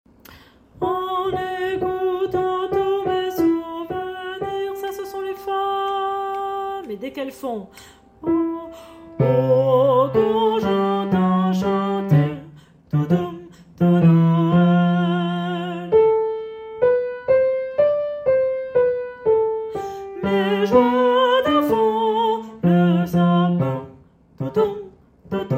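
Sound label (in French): Basse Tenor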